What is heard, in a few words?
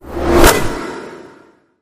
Hoop Swish Whoosh